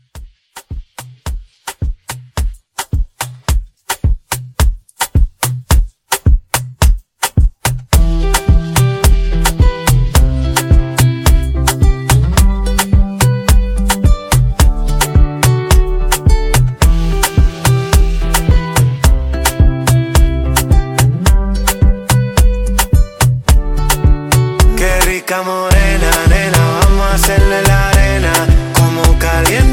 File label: Latin Club, 108